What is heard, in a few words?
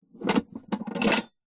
box container industrial metal small unlock latch; foley